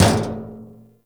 Physics Barrel Impact Medium, Metal Barrel; Warframe; Physics Objects

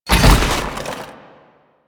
Motorcycle Collision Fast; Vehicles; Warframe; Motorcycle Ninety Nine

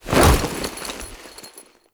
Foley Melee Sweep; Warframe; Kahl

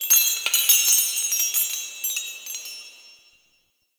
Shop Door Chime; Warframe; Eidolon Plains; Ostron Village

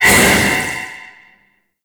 Void Release Blunt, Weapons; Warframe; Duviri; Incarnon Adapters

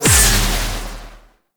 Void Dagger Proj Explosion, melee weapons; Warframe; Zariman Dagger; Void Mode

